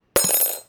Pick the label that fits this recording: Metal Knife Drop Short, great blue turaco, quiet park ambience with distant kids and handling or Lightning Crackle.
Metal Knife Drop Short